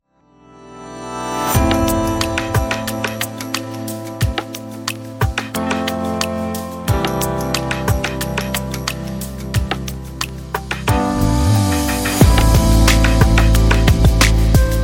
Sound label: Fashion Ambient Beat